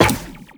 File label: Tenno Run Zipline, Footsteps; Warframe